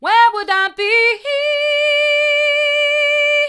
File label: where would i be hard, vocal